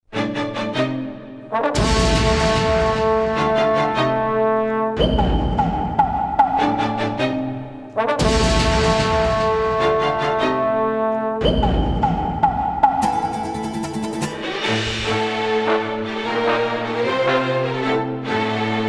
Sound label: battle music; Tenchu 2 Birth of the Stealth Assassins